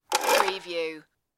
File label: engine start belt